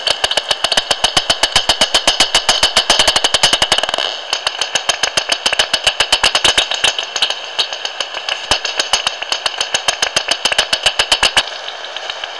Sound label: Bats over Lopez